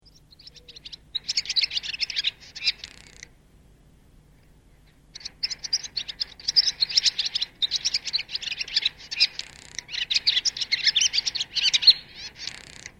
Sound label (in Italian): rondine; faunistico